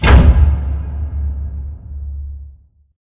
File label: pod door close stop; Titanfall 2